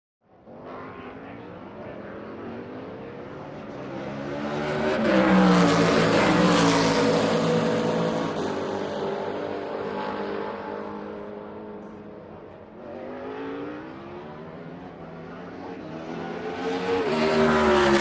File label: Sports Car Race Pit